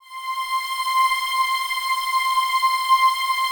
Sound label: PAD; Best Service; XX Large; Pads